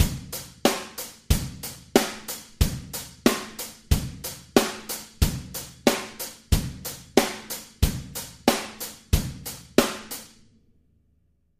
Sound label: click; drummer play to a click track